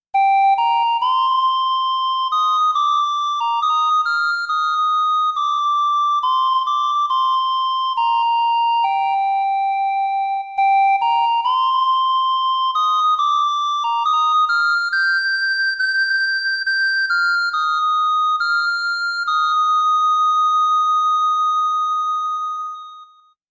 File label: Panflute Echo; Yamaha SMAF demo ringtones; Jupitar; SMAF Entertainment